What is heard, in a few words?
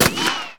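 gun concrete impact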